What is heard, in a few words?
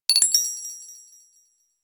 reload